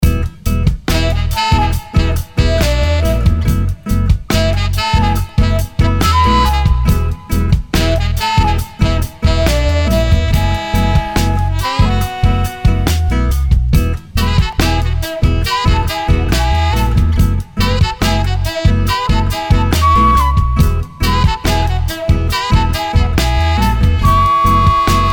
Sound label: Mellow Flute and Sax